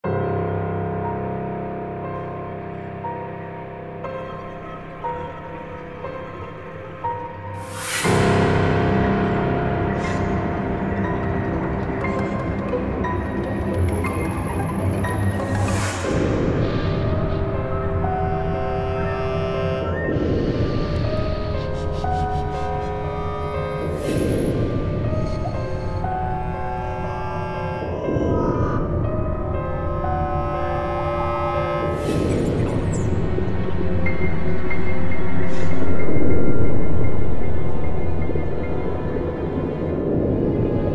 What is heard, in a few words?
horror